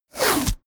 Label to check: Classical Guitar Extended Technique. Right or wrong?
Wrong